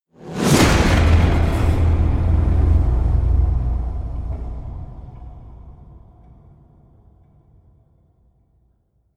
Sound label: evil slams with whoosh; devil